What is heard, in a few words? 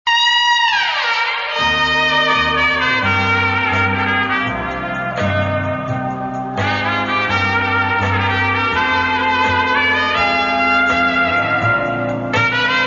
Trumpets Love Song